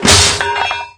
Vehicles Generic; unreal development kit

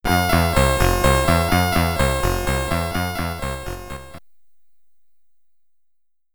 Get Ready! SID Stereo; Deliverance; Commodore 64

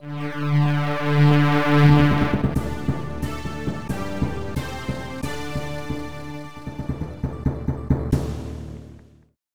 Logo (SNES Version); Ocean

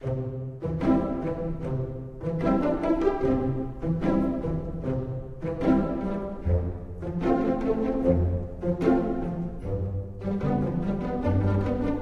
Casual Theme; Box Factory

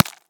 grab ice; ice age scrats nutty adventure

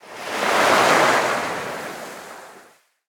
Beach Wave

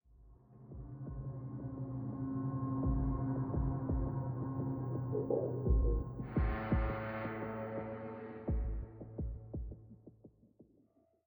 Military High 85bpm

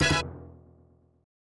1-Up; Izzy's Quest for the Olympic Rings; SNES

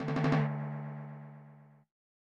Drumroll End; the magical quest 2 the great circus mystery; snes

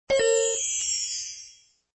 Checkpoint; Shop Empire 3

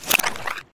Ice Wall; lego ninjago rise of the snakes ios gamerip

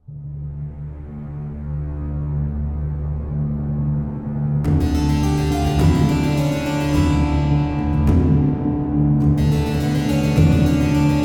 String; Assassin's Creed Unity

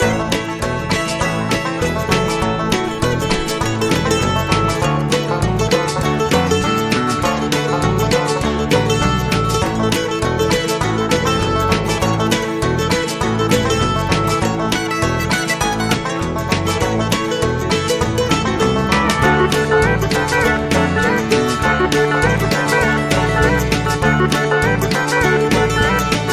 Hillbilly Bootstomp - Loop

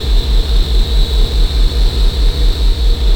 final Ship Idle

